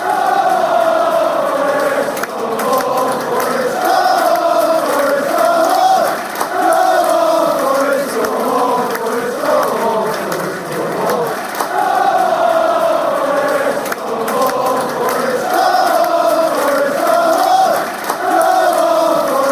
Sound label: come forest fanchants